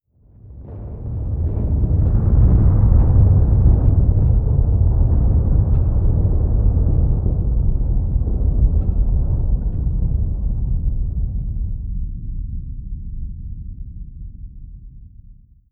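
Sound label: explosion building distant stereo; Explosions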